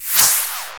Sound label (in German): zisch